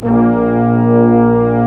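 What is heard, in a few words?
brass section, large ensemble long; Roland LCDP06 Brass Sections; Lrg.Ensemble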